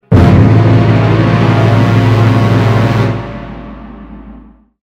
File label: Scary String Hit A